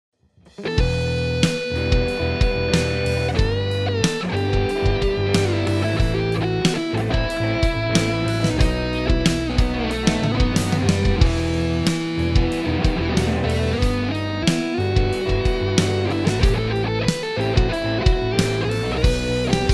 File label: harmonic minor